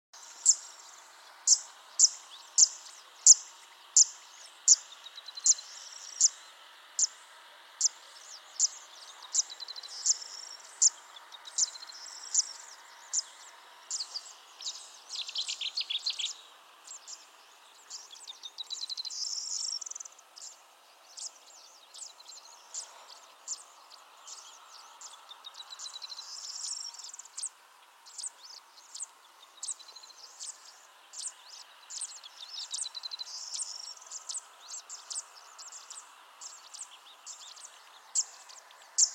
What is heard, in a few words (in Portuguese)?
canto da fuinha dos juncos